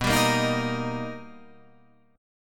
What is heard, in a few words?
B Augmented Major 7th B, Guitar, Drop D